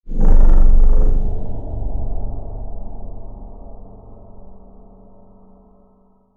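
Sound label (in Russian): космическое звучание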